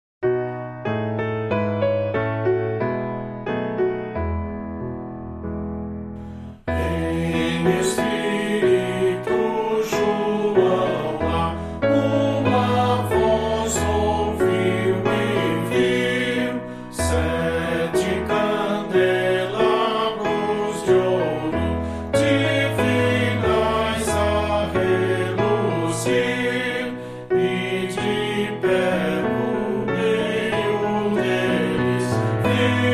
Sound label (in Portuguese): voz piano